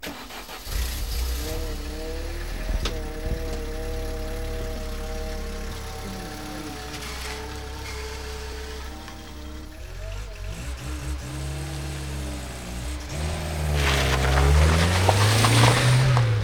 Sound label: auto